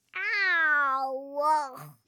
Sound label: ouch; CosmicRage; CosmicRageSounds; birthday